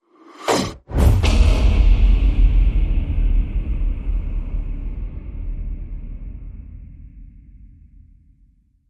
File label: Hit Hor Dart Gun